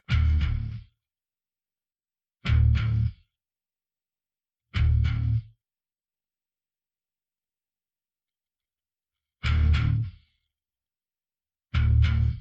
Bass

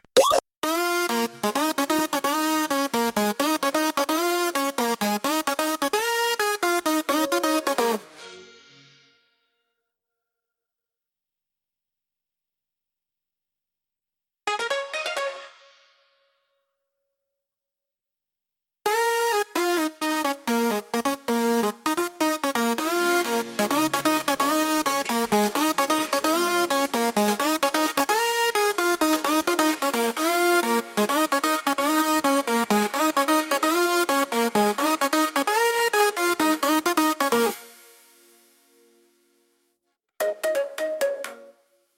Synth

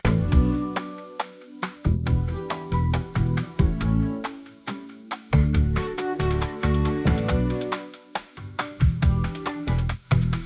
Instrumental; Três patinhos foram passear

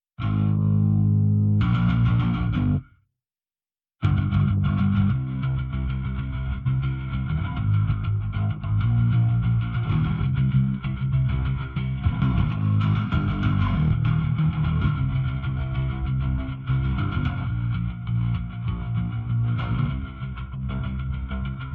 Bass

